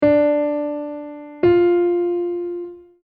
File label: Grade 6 Recognition of Intervals